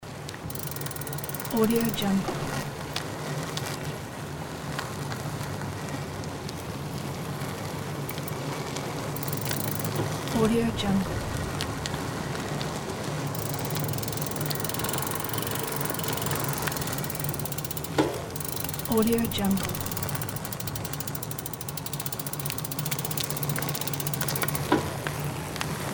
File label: bike ride loop